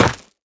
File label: step run, footsteps, gravel